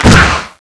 spider succes, arachne

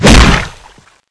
mace hit; weapons

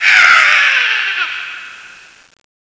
fly zombie death

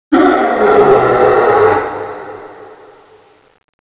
dead, zombie; zm mod

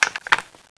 laser guide draw, weapons

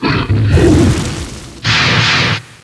fireball; revenant; zombie giant

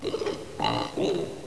bomb idle, zombi

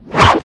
whip sword slash loop start

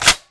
clip out

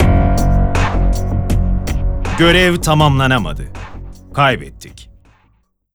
round lose; wolfteam

